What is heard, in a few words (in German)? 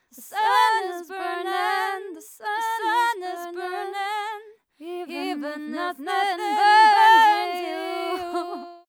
Vocal Special Plopp